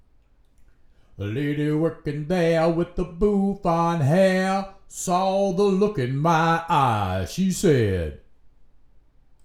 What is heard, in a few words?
vocals